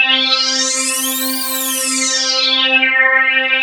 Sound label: SYNC SWEEP